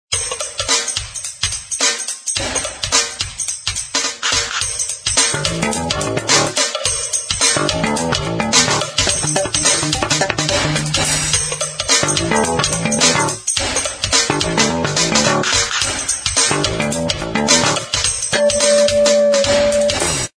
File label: Bassy; Samsung; SGH-Z510